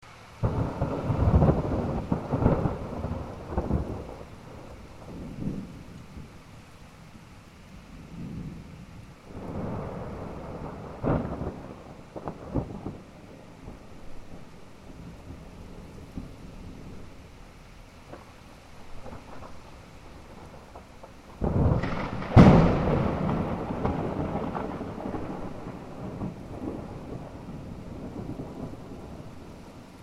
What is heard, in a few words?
thunderstorm